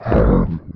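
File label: lizard attack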